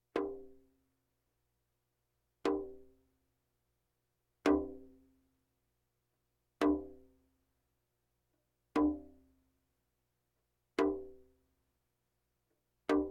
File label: Percussion Bongos Tympani rolls Gongs Drums